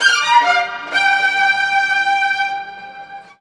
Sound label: STRG; USB Soundscan vol.32; Roots Disco; AKAI